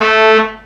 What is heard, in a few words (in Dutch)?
trompet